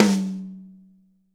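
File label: high tom; 90s sample CD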